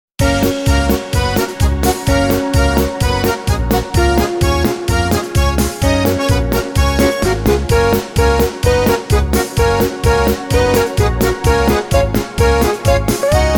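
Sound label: minus Gb major alto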